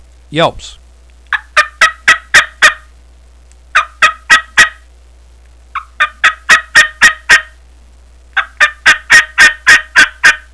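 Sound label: yelps, turkey call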